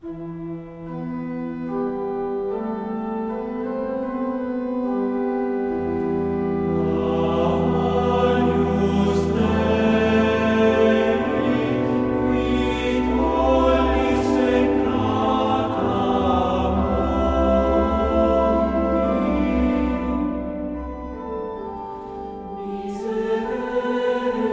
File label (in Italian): voci